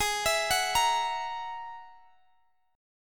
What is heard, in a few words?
G sharp 7th Suspended 2nd Sharp 5th, Guitar, Half Step Down; G#7sus2#5